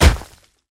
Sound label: splash; snow warmup